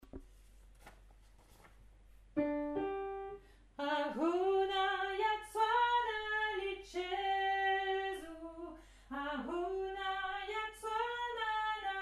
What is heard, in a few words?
Soprano; Ahuna